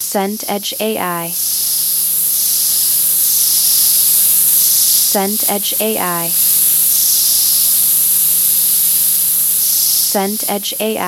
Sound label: ambience nature